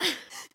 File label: Pant; Lewd SFX